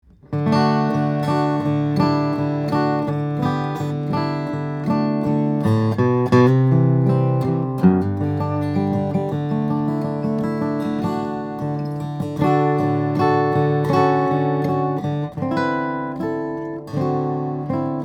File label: Charis Deluxe Performance Dreadnought, steel string guitars, flattop; figured bubinga adirondack spruce